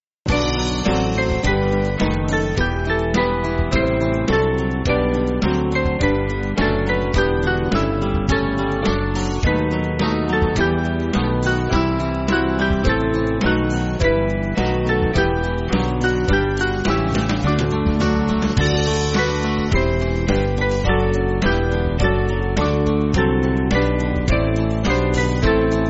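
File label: Music Backing Files for Churches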